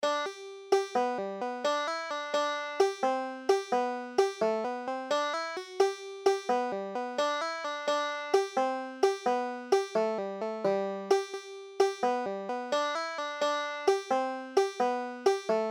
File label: larry ogaff, banjo